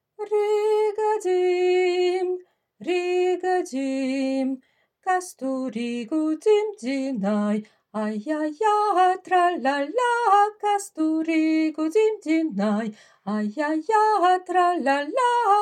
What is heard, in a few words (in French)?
Riga basse